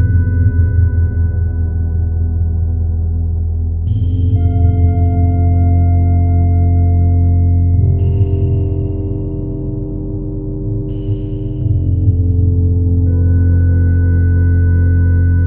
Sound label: loop, music loop; anvil